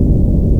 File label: tunnel